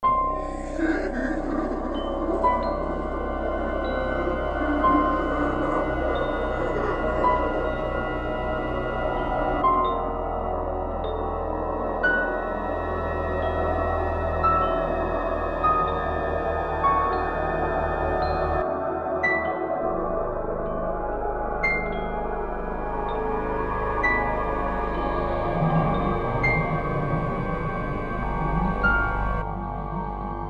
Horror; day of the zombie windows gamerip 2009

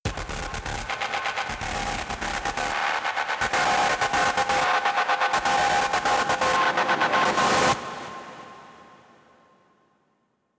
Transition Guitar theme

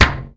Slap; Talking Ginger; Android iOS

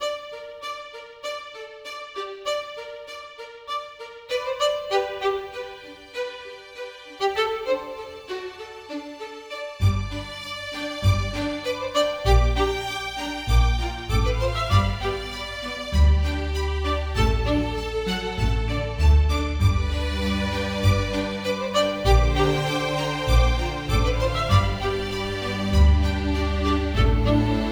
Music Looter Bowed; 7 Mages

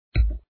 Error

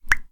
Fluid Bubble

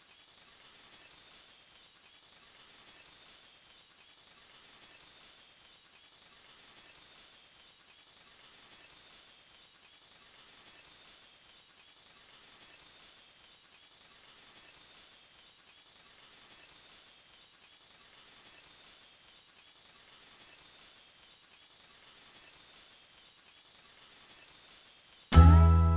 Nugget Discovery Loop End; The Oregon Trail American Settler

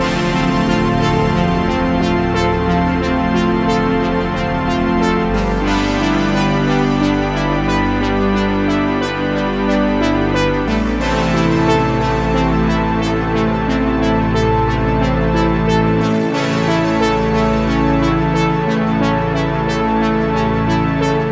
Music Loopable 90; Wise The Wise Path 4

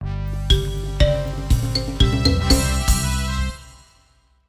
Level win; mermaid adventures the frozen time

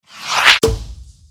Character Attack

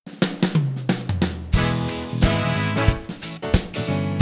main menu intro; Sesame Street Ready, Set, Grover

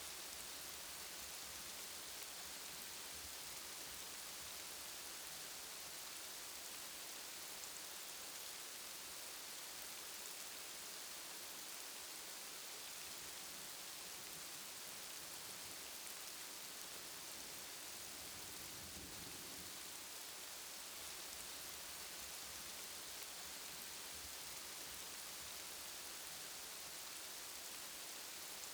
rain loop

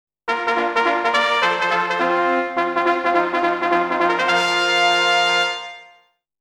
Totally Eager Fanfare; Monster Farm 2

